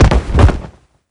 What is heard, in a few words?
fall; osu